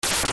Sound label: sword slash long; ff3; ff3us